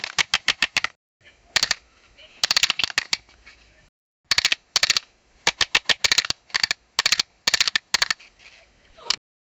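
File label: camera shutter clicks flash; photography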